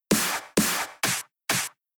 DubStep SD, Rhythm

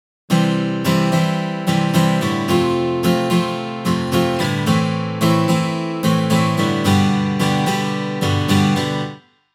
guitar 8beat midi change

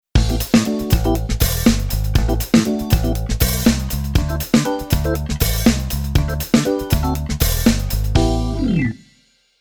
Keyboard Organ Funk

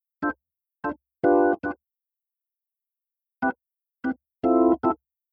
Keyboard Org

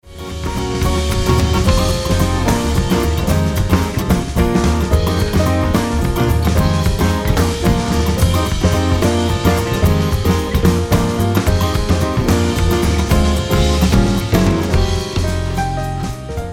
Gt Back

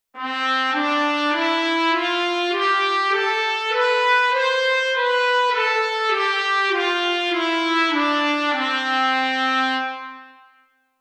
WW Brass

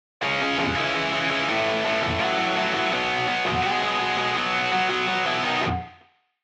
guitar pattern 2 gt

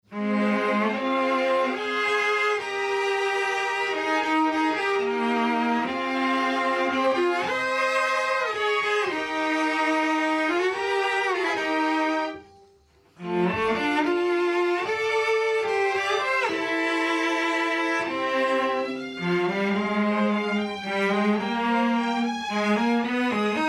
Strings Vc Comp On

